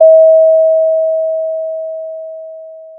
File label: Sound of a doorbell